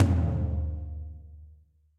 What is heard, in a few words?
Tom Floor Dark Plate Verb; drum hits; Ableton; Factory Packs; Session Drums Club; Standard Hits